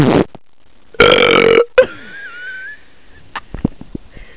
Belch, Disgusting Sounds; Dave's Disgusting Sounds

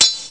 Sound chef fling block; Creative Review CD-ROM 28; kungfu

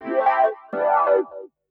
ORGAN, Keys; eJay

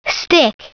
stick

